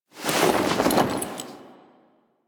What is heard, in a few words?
Camp Canopy Flap; Warframe; Quests; Veilbreaker; Kahl Camp